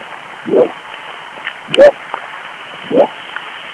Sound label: woof woof woof